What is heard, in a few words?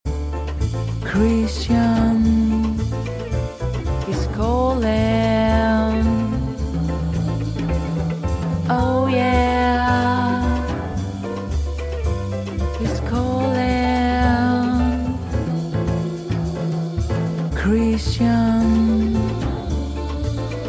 jazz ringtone